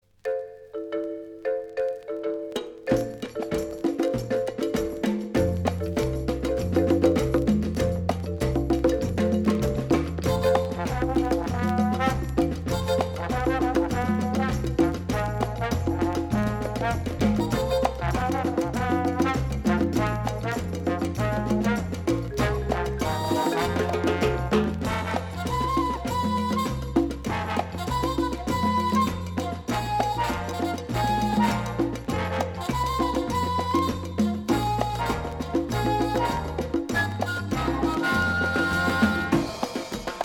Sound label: MODERN JAZZ